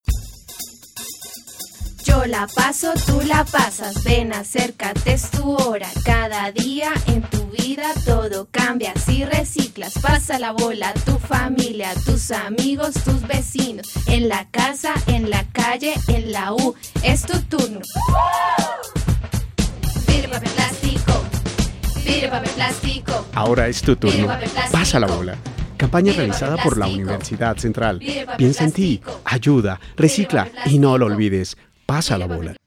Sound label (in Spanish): Jingle Reciclaje; camp ambiental